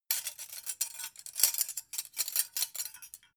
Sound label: dough hook handle; industrie-wirtschaft; gastronomie